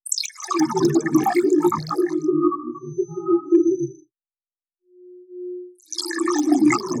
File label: glitch slide